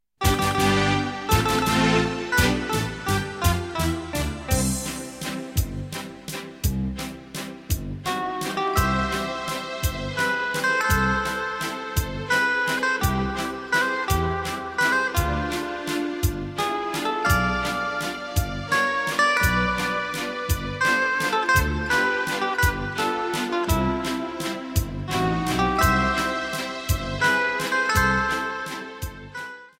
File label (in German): orchester; wiener melodien